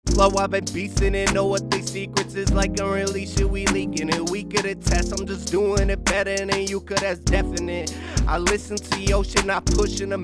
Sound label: Sample Hip Hop Before